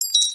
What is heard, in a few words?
coin